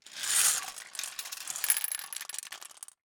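Gumball machine, metal, glass, candy, flip, jostle, clatter; Eiravaein Works; Vaeyan IV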